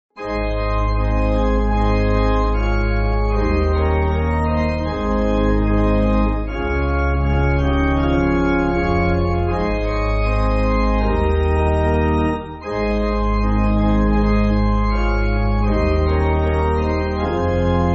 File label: Pipe Organ, Backing Files for Churches; Give Me The Bible; Lorenz